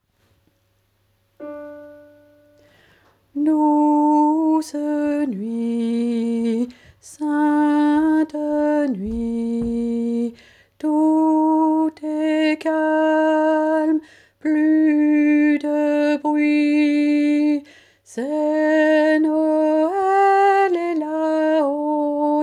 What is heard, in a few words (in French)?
Douce nuit Alti